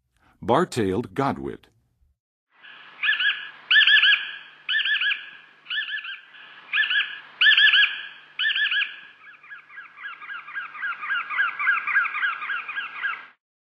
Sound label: Bar-tailed Godwit, Birds of North America; Western USA; Western USA Songbirds